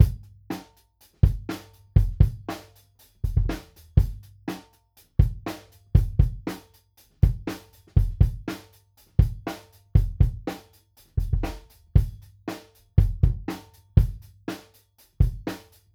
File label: kick mapex front; crowley and tripp el diablo; mapex 24; drums